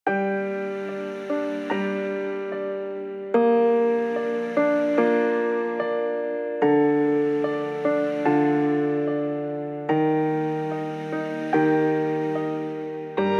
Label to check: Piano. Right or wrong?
Right